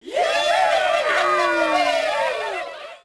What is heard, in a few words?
CROWD POS MED; Cars; XBOX